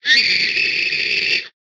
ホラー 絶叫

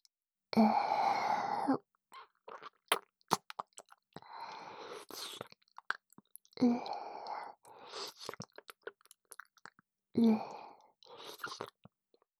ねっちょりと舌先を絡めあう, キス; おまけBGV

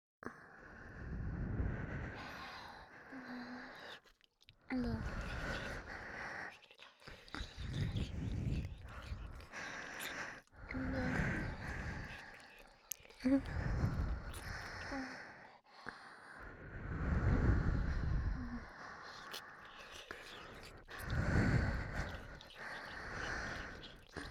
両側吐息＋舐めフェラ

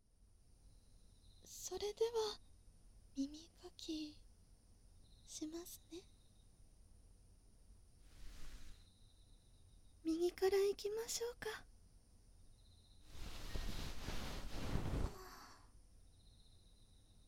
右耳かき, ASMR; お隣さん夏

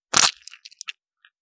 射精音

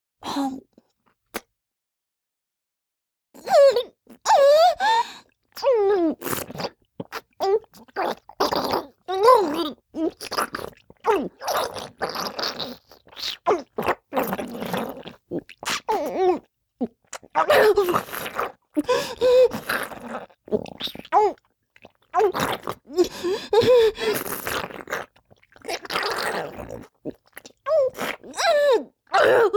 口内放尿→飲尿; 実用ボイス抜粋集

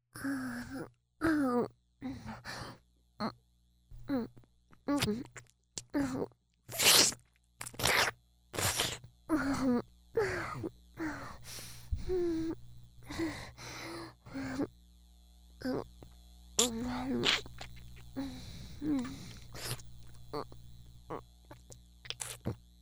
フェラチオ, ASMR

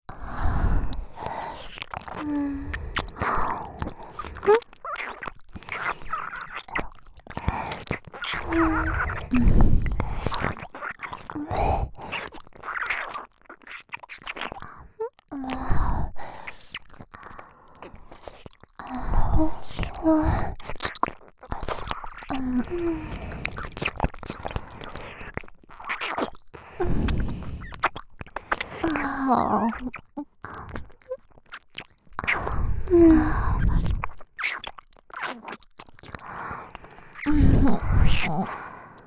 両耳舐め; L副会長・R会長

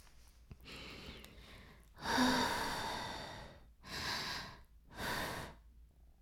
耳舐め音声 (LR逆ver), ASMR; 白くて黒い魔性円環

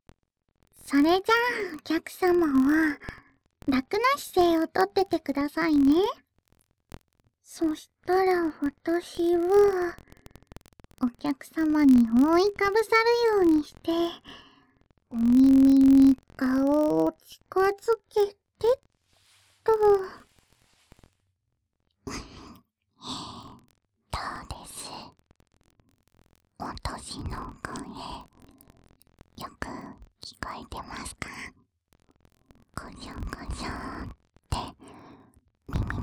耳舐め, ASMR

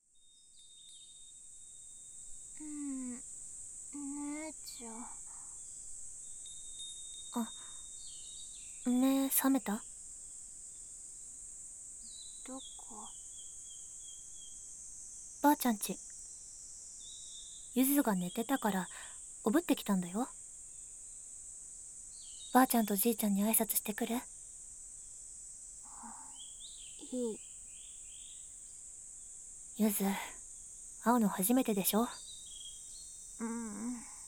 縁側で耳かき; 百合でエッチな耳かきボイスβ

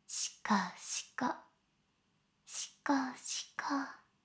しこしこ音声; 少尉; 牧場戦腺

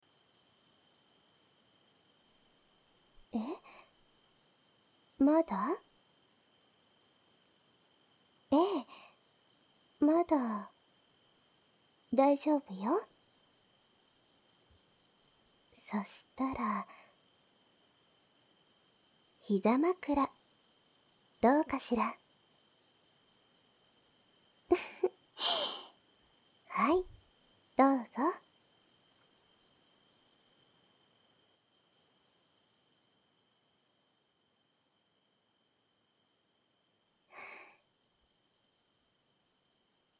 夜風にあたりながら耳舐め; 癒しを届けに行くお店 憩恋

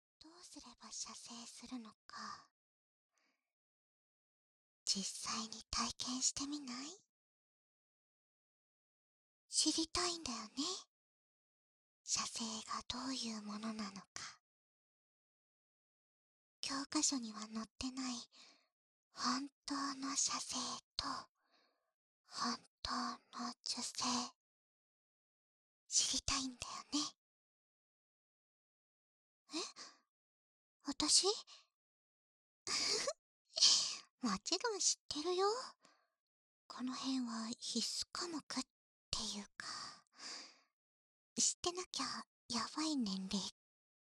耳ふーふー; オープニング～初めての勃起～

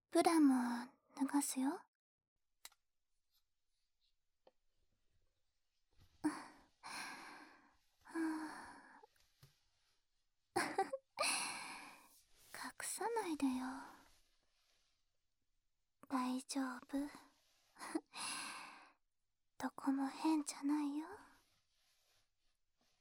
濡れたタオルでふきふき; 安眠

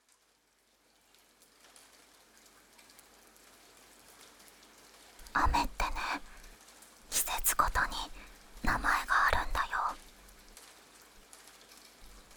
雨のささやき; ツキシグレ～下弦～; Raindrop本編

